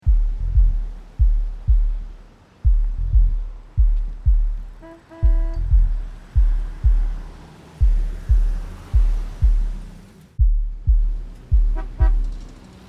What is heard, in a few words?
club nightclub loop; curiouslystrongallnightlong